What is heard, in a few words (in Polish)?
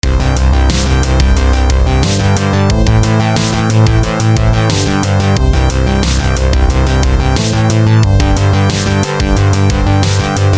retro moje